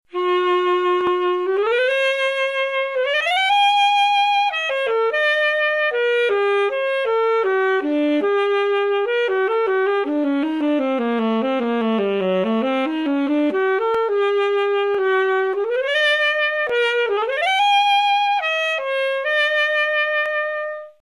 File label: saxo